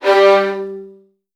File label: G1, VN 16TH, Virtuoso Strings; ILIO; Kirk Hunter